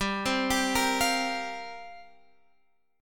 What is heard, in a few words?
F Suspended 2nd Suspended 4th G